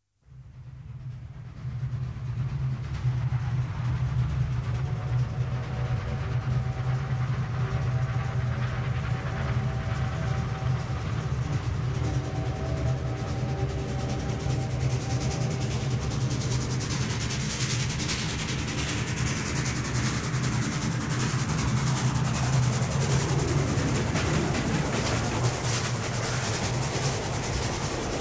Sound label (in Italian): TRENO A VAPORE PASSAGGIO; trasporti; treni